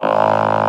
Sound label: VS G1, ANA VOX, SYNTHESIZER; AKAI S6000 CD-ROM - Volume 1